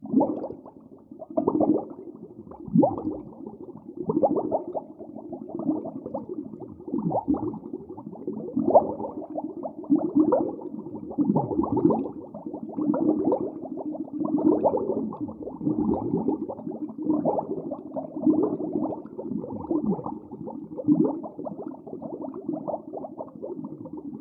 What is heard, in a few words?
Diving Underwater Bubbles